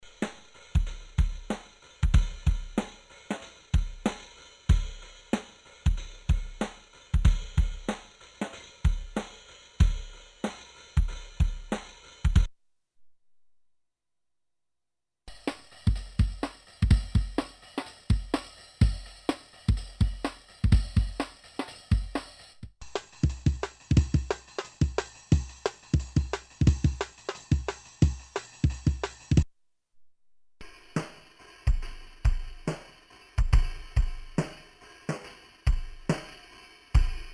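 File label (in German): Emax 10 kHz Drum loop Transponieren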